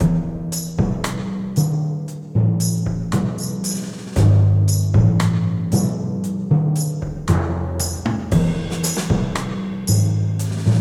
Sting Loss

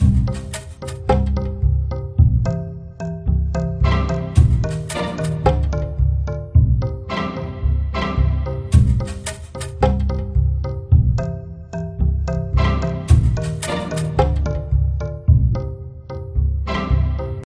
Jungle Level

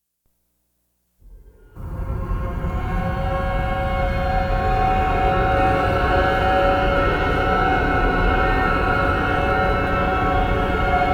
Exterior; Outlast

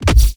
energy impact

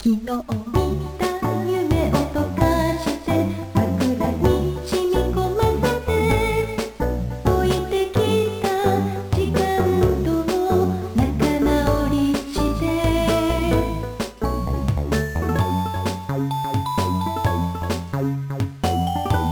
Japanese Ending Song; Wario Land IV

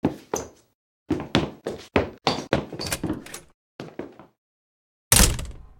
Run; silent hill homecoming 2008 gamerip pc ps3 x360

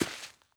walk grass; lucius

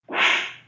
SFX Critical; Castaway

